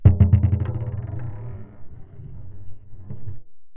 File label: Marbles & Framedrum; musicradar; contact mic madness; Raw